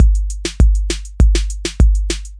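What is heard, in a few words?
masmoudi, Trommelworkshops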